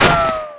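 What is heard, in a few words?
Ricochet; Deep Fighter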